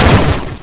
ship boom; ruby-rudl; rudl-0.8; crapola

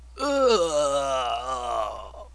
player death; twitch-1.0

